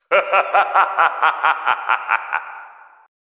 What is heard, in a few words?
evil laugh